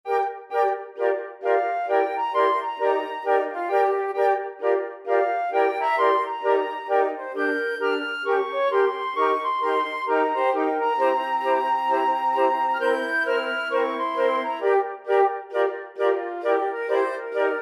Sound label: flute choir